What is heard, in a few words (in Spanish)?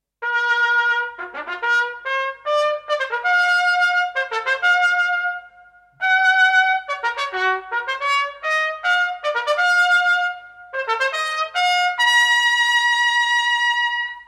TROMPETA